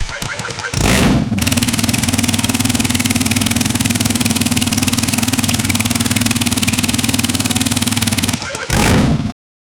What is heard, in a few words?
formula 1 engine onboardi, race car